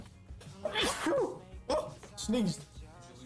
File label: oh sneezed